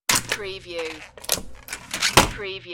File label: Can Crusher